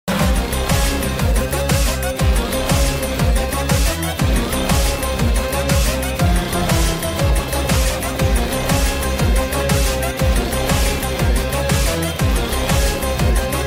bgm ringtone south bgm ringtone tamil bgm ringtone telugu bgm ringtone; ravanasura